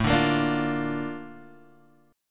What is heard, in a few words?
Bb Major A, chord; Bb/A strummed; JGuitar